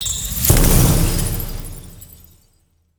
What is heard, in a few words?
bomb explosion